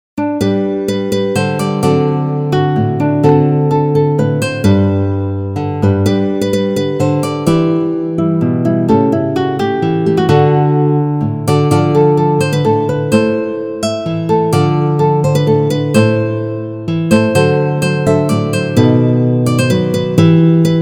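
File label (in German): Auf Flügeln des Gesangs, Gitarre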